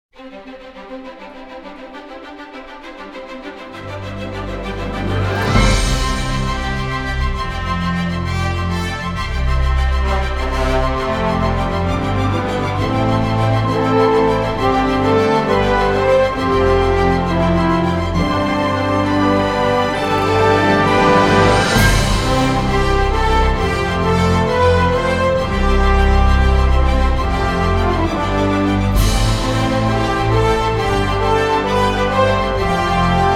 大气震撼

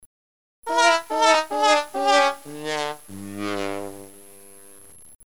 无奈

